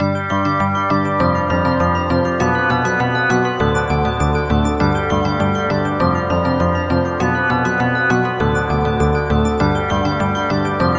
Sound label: Bell Ringtone Bell